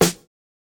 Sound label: snare samples, Rock